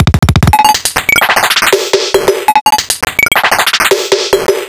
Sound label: Casio Rapman